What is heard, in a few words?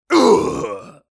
mobster pain sharp